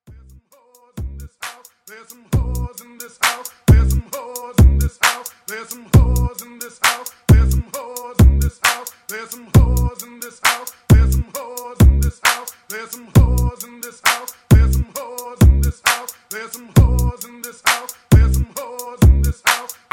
Top40 Redrum 133 Bpm

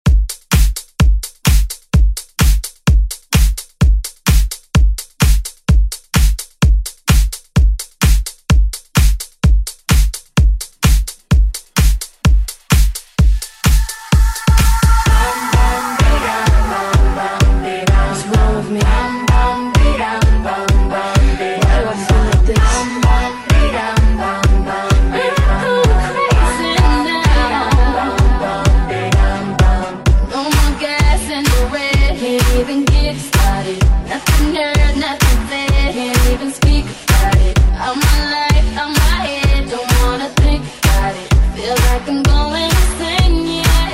Pop Redrum

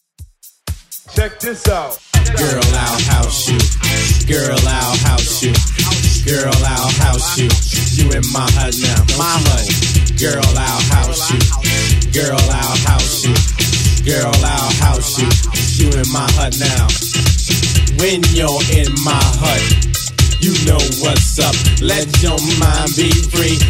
Club Mix 80s Old School DJ Intro Outro 123bpm

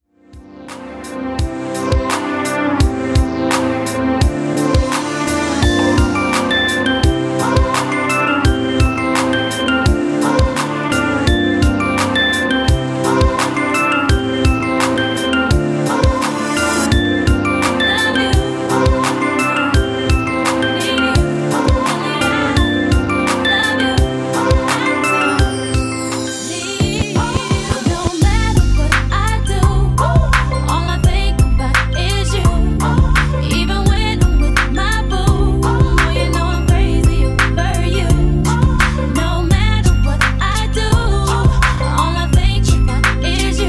In Outro Party 85 Bpm Clean

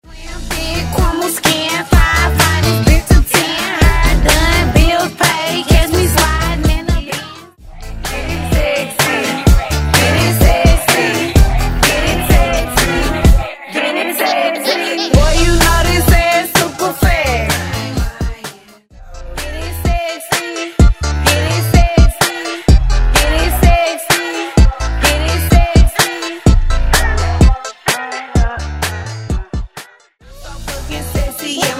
Mash Up Dirty 9A 127

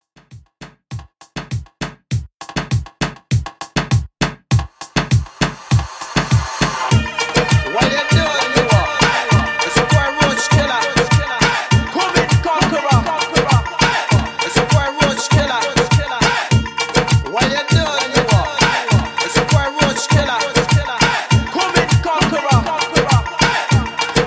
Reggaeton 100Bpm

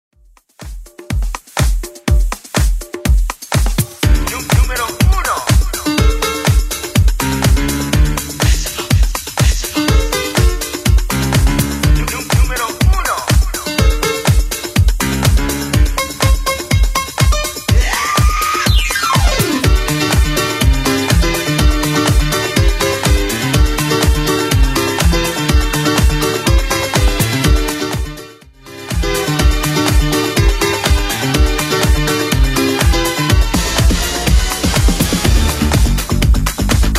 80s Club 123 bpm

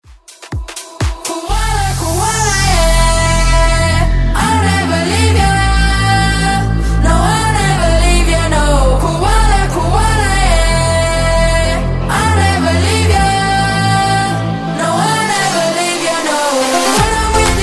House Redrum

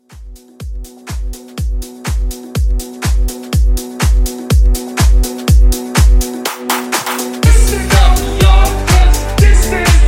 House Short Edit 123

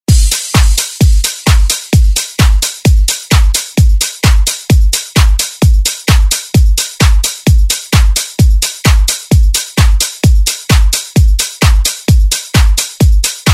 house redrum

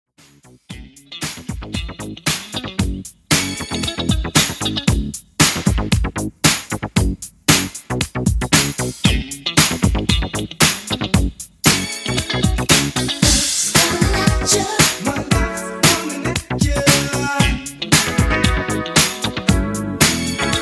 80s Funk Redrum 115 Bpm

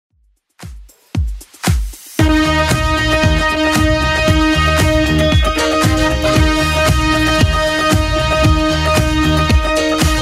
70s Club Edit 115bpm

